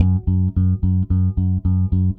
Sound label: Eighth Notes F#, Bass; Bass X